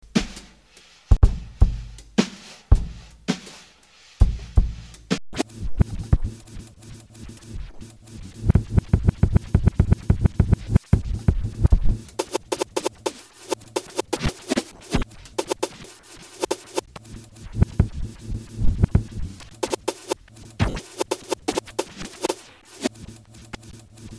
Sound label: scratch